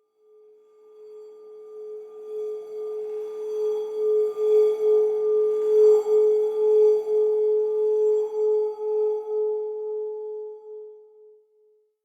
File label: Clan Dojo Metallic Rand; Warframe; Dojo Randoms; Ambience